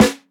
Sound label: Original Snare Drum D Key; snare drum samples